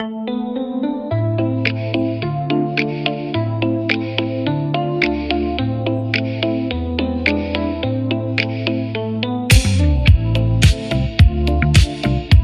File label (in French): petits poissons karaoke, chansons comptines; les petit poissons